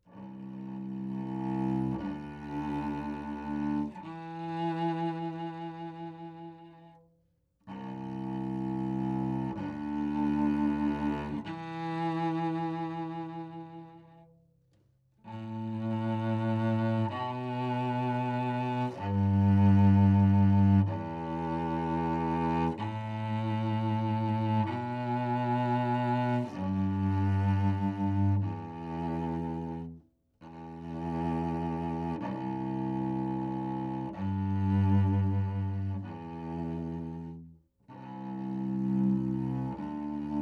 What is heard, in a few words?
Cello